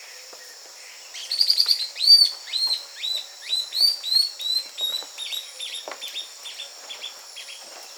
Pied puffbird

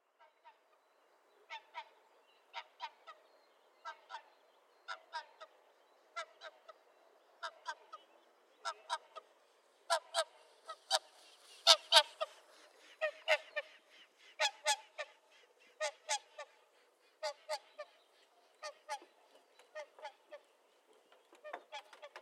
Whooper swan

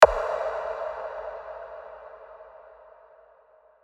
ping contact cinematic trailer sound effects

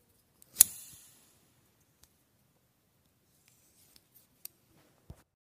flick knife; چاقو ضامن دار

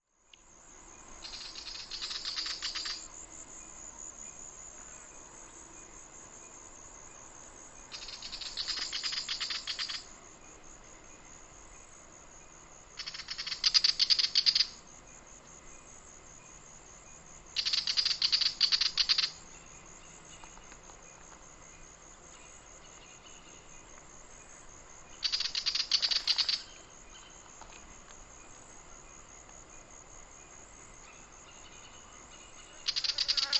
Willie wagtail, پرنده دم چتری ویلی

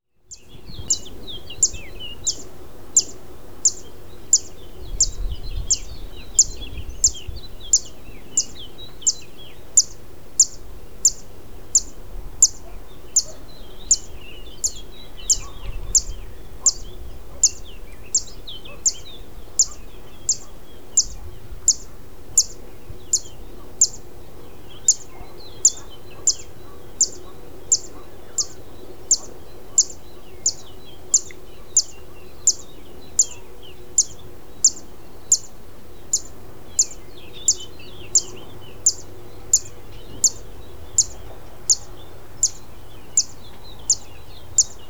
Zitting cisticola; صدای پرنده سبدنشین چکاچک

